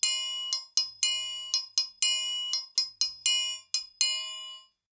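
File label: triangle, Percussion Loops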